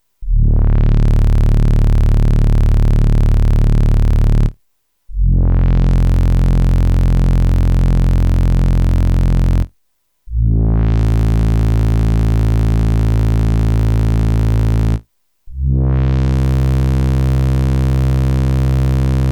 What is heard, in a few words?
Analog Bass